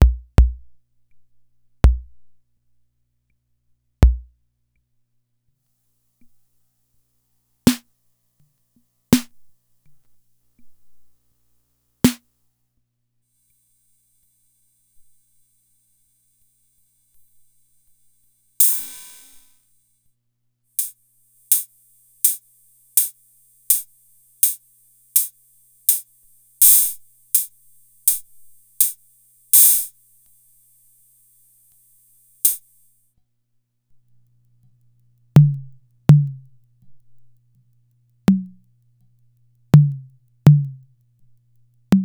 KR 55B Kit; classic machines

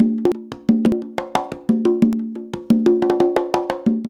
CONGA 119, Modern Percussion Loops; USB Soundscan vol.56 - Modern Percussion Loops; AKAI

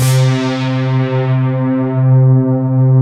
SYN, SYNTHS; Pro-Rec - Synthsonic

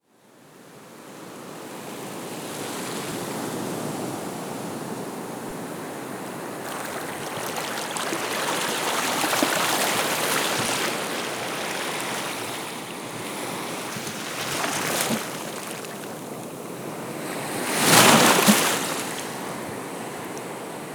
SEA; USB Soundscan vol.45 - Indian Traditions; AKAI; AMBIENCE2

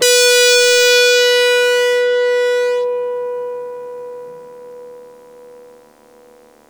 Blues Pick B4, Blues Guitar

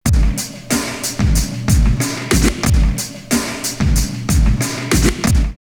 boys own 92, drum loops, old school; X-Static Goldmine

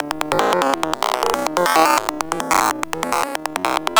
Generator, Machinery; Sonic Foundry (Sony Creative Software); Myths of Technology

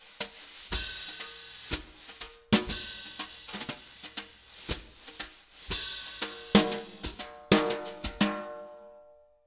Jazz Latin Drumloops; USB Soundscan vol.08; AKAI

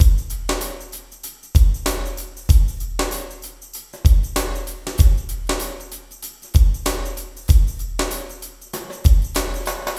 LOOPS

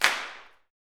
CLAPS; Vocal Planet Vol1 Gospel; CLAPS+SNAP

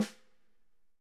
Ludwig Snare; EMU PX-7

